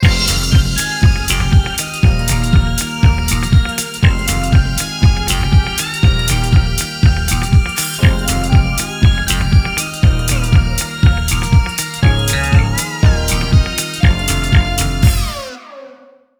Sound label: Match Over; Disco Kid